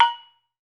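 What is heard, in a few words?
METALOPHONE, Pitched Percussion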